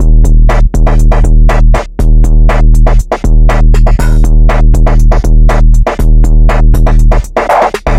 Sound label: beat hip 120bpm